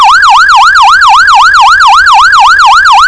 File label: Yelp; Code3